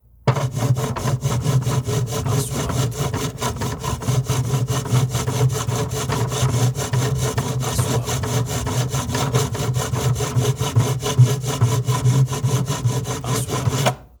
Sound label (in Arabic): منشار; sound effect